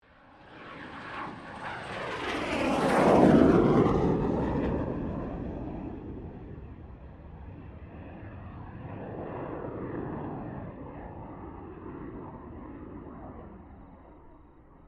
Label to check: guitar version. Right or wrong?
Wrong